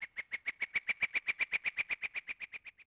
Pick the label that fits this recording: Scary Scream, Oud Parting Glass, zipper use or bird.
bird